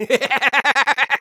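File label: laugh happy, German; Soldier; Fröhliches Gelächter 2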